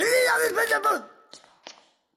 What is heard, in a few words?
raving rabbids